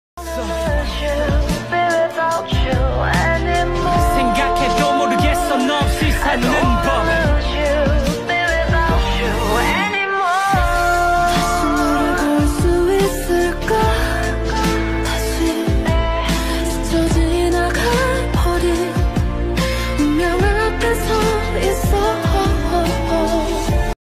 korean ringtone

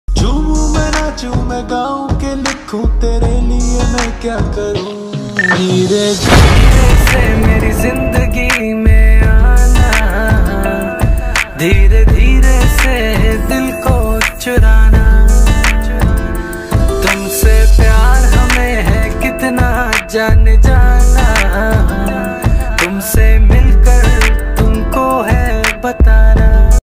slowed hindi ringtone

